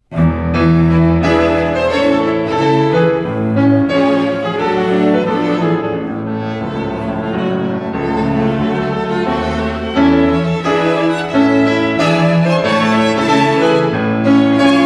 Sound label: Piano Quintet; Reinecke